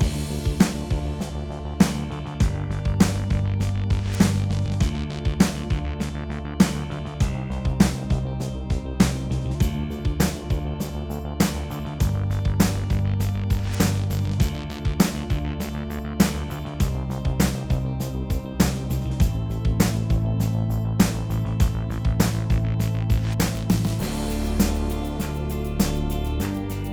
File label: Looping, sci-fi